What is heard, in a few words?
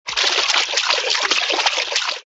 trout; camp rock; rock n row